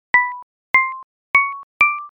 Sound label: cave drip